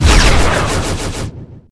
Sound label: flying discs; Tanks